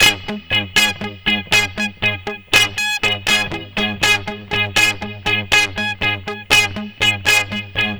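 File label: Guitars 120 G#; Zero-G Kocktail Kollection; Adrennalinn 2fers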